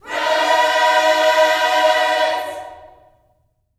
PRAISE, Vocal Planet Gospel

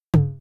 Tom Analog, Yamaha RM1X; AnlgKit01

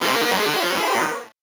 VocoBit Noise, 8-bit; musicradar; 8-bit bonanza

